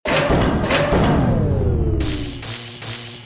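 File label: laser gun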